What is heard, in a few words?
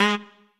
Trumpet melo